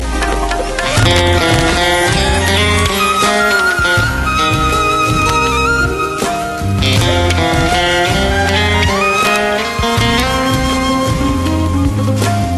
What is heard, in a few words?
Guitar